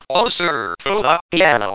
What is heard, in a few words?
Closer to the Piano